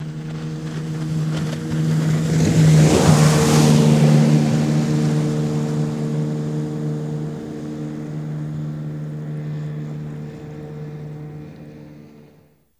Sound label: Boat 10 Hp By Fast